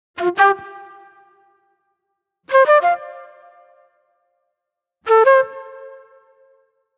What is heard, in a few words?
Acid Jazz Flute